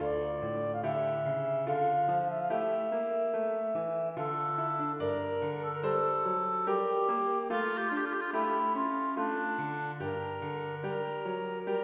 Menuet for klarinett og piano; Aamodt 013g

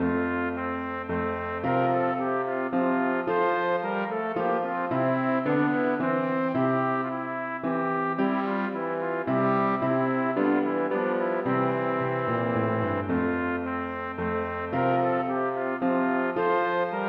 Menuet for trompet, bariton og piano; Aamodt

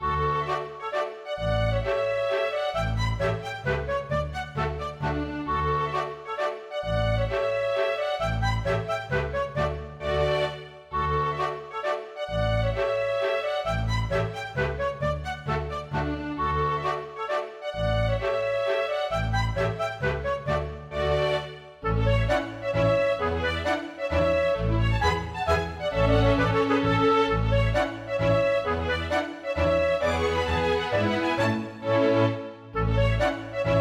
Polka Masurka; storgårdsmusikk

